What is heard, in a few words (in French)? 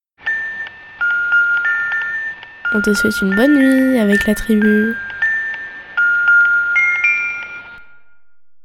jingle bonne nuit